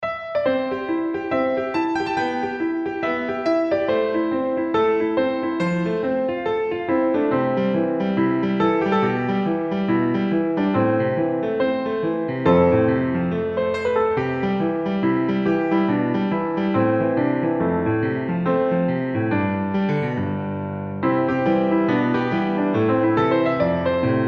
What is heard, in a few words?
Toddler Dance Bounce